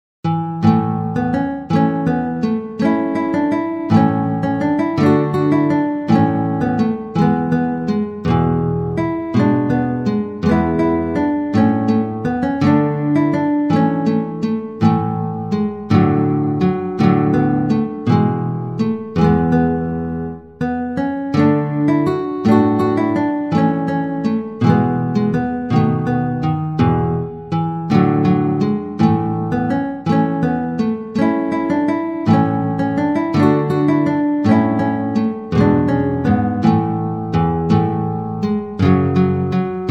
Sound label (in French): guitare solo